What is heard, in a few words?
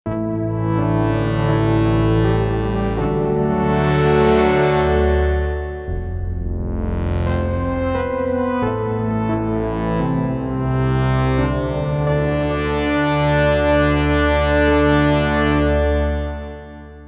Filter Open; Korg MSC-1S Variety